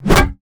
stick imp

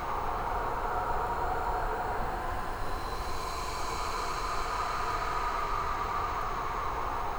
atmosphere horror

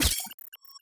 skill handgun

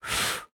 blow fast

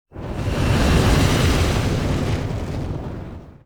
death fire

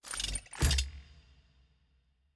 enter

